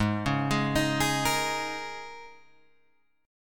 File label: Ab Suspended 2nd Suspended 4th Ab down Guitar Open G